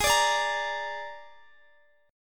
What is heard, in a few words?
Ab Suspended 2nd Flat 5th Ab Guitar DADGAD; strummed; Absus2b5; x,x,18,19,17,20